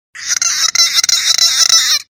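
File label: dolphin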